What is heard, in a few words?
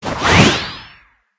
Light Sword Swing; borsalino